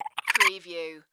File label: Monster Small Animal